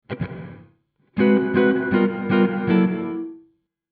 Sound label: Guitar Gated Mix